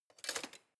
knives wood drawer place soft

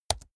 keyboard laptop space press fast hard

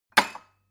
glass shelve wood

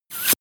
air pressure release

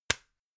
gum plastic box close hard

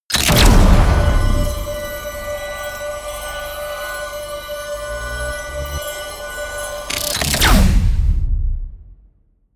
promo pad

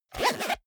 zipper small case close fast

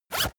zipper use fast

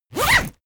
zipper open close

